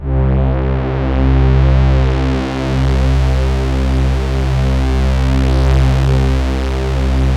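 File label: C2 big orchestra; Sound Gallery - Charged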